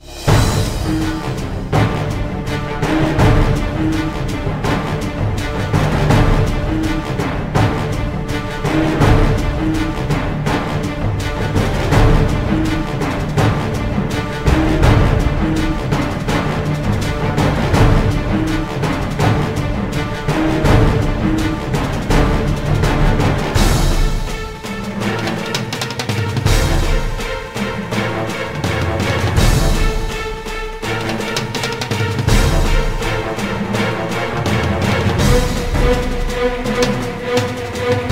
Boss Fight Music; Space Marshals 2

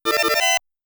Credit Sound; galaga

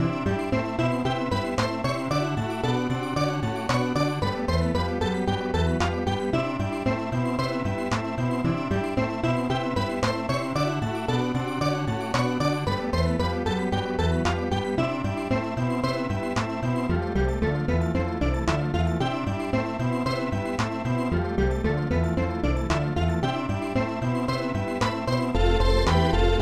Piano; Kingyo Chuihou Tobidase Game Gakuen; SNES; Piano Game 2

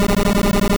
Psybeam Loop; sfx; attack moves; pokemon; gen 2; gsc

